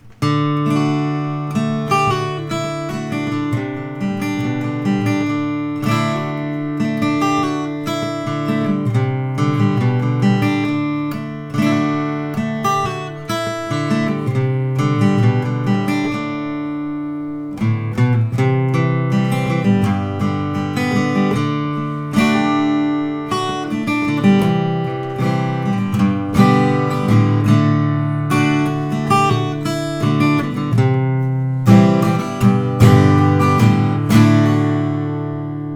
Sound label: collings 01 sb 14 fret short sunburst